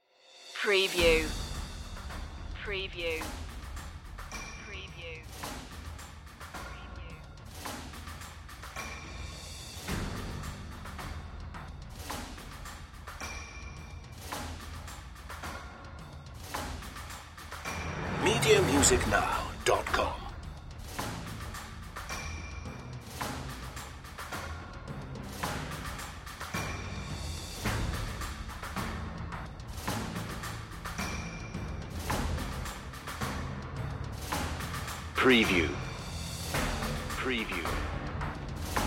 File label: percussion only